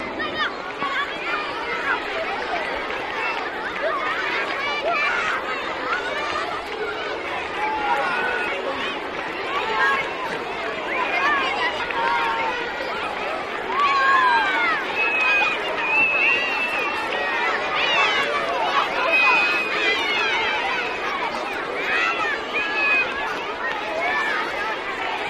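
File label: Children Group Idle, kids play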